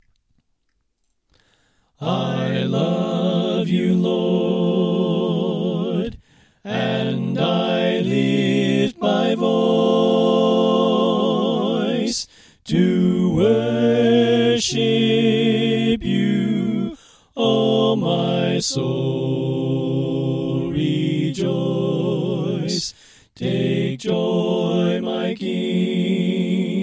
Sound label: Soprano Right